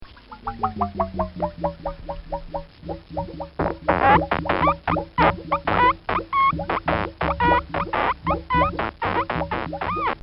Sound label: Plop Squeak; Squeak Sound Effects; Objects